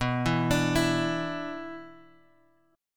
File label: B-Minor Double Flat 5th; Bmbb5 Chord {X 2 2 X 3 0} arpeggiated